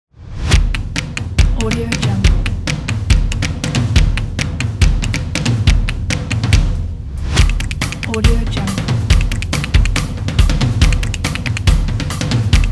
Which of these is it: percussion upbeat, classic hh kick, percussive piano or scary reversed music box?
percussion upbeat